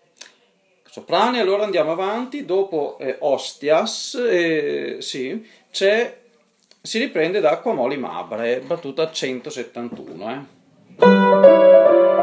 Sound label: Soprano; Offertorio; requiem rossini